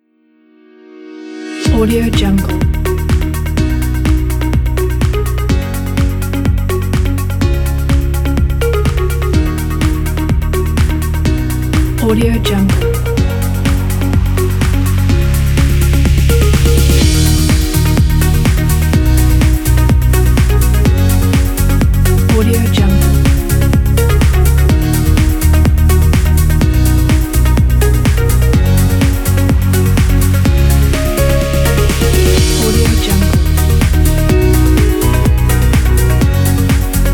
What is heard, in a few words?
pop uplifting techno